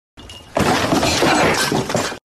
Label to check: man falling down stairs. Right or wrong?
Right